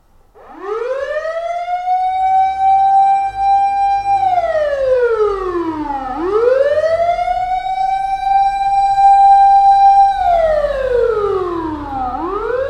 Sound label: fire engine starting